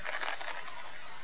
climb rope

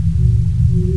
ambience

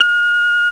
cardreader beep

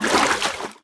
water out; Liquid

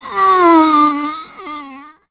zombie idle, male

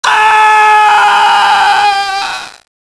fire death scream

